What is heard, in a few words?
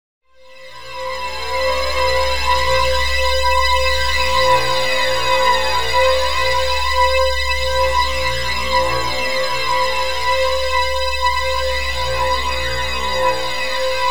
landing sinister